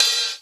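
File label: Closed Hi-Hat, Dance Drums; Best Service ProSamples vol.15; AKAI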